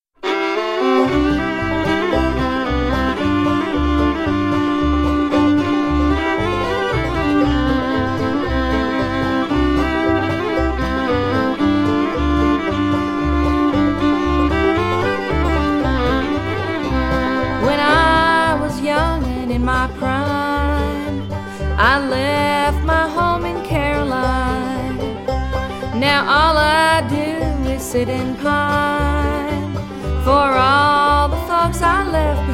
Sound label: Blues